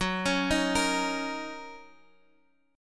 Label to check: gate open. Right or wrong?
Wrong